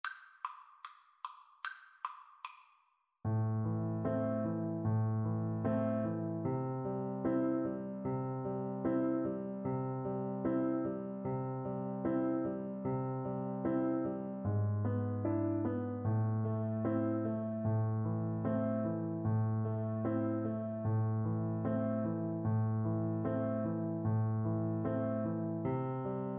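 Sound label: el condor pasa Oboe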